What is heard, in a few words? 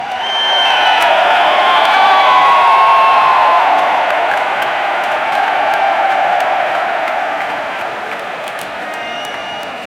realistic soccer